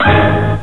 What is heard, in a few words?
orch hit